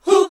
HAL GOSP. MIXED

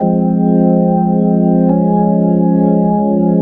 Organ, Loops; Trip-Hop

